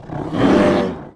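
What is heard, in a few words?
attack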